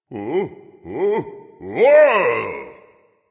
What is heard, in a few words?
fear, voice; Smite; ymir skin9